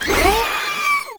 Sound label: Maggot Attack; Enemies; Vocals; Warframe